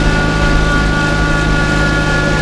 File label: revers; Mafie 1